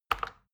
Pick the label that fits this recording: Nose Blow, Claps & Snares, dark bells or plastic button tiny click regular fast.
plastic button tiny click regular fast